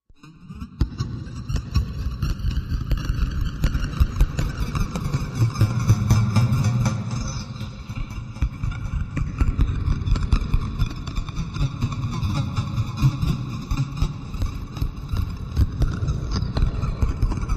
Crackle Hum Machine El, electrical

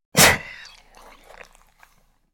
Lynx Sneeze Lip Smack

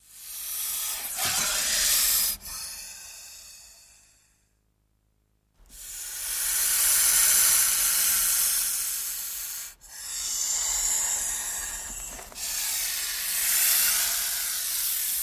Snake Hiss, Breath, Various

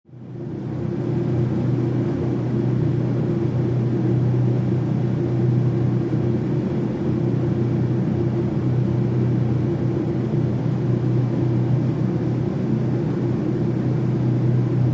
747 Jet Interior: First Class Section.

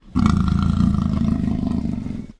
Leopard Growl Hollow; big cats

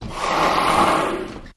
Puma Exhale Breath, big cats

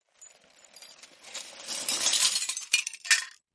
Glass Debris

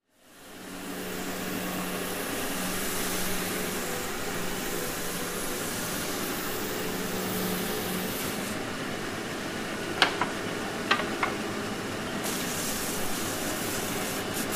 Carpentry Machine Wor; construction small

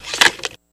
Telephone Antenna

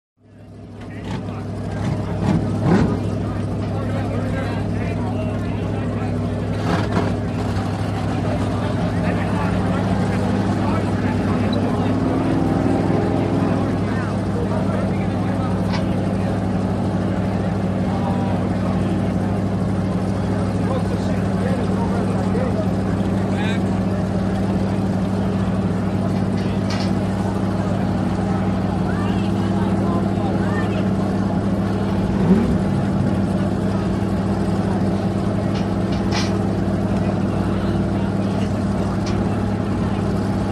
Power Boats Pre Race, off shore power boat

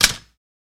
Typewriter Manual; typewriters

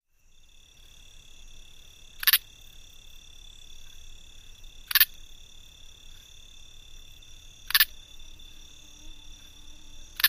Cricket Clicks Close

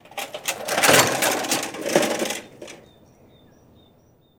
Bicycle Crash Fence

